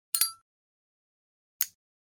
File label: Click Zippo Replica ricoshet